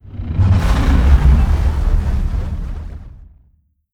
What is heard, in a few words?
sfx colossus moving out; Stellaris; nemesis